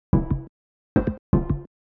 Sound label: HOUSE EXTRA, 125